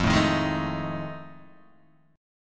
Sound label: F 7th Sharp 9th, Guitar, Open D